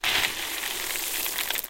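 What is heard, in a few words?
coffee bean sounds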